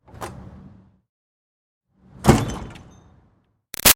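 Door Metal Commercial Pull Exterior Med Open-Close; Doors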